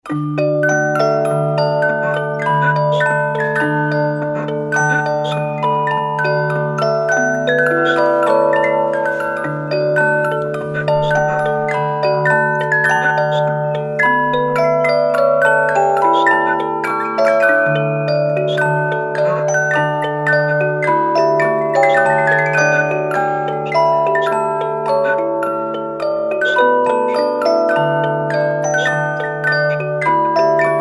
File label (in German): Kleine Walzenspieldose